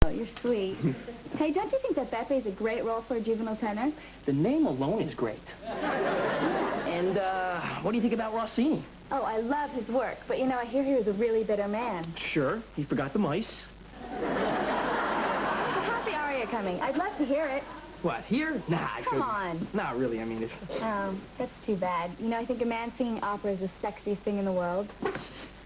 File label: singing an aria